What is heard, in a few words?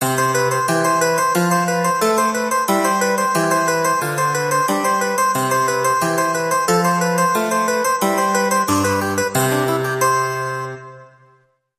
Harpsichord Classical Fanfare